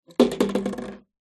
plastic bottle empty knock over

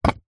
brick stack on top of another; foley

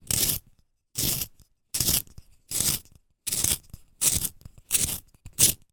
office pencil sharpener manual slow

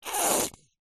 food chocolate sauce bottle squeeze squirt; zapsplat

